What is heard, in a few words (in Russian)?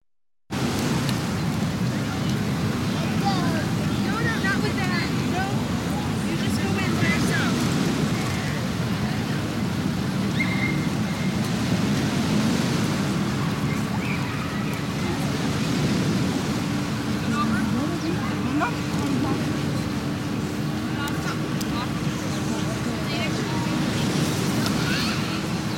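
звуки на пляже общая атмосфера прибой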